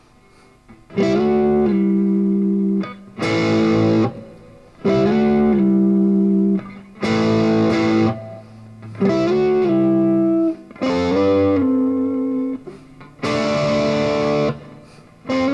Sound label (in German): Dynamik Hals PU crunch